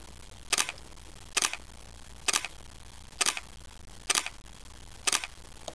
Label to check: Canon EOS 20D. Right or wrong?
Right